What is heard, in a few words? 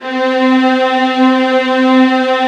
violins D, Synclavier Strings; ILIO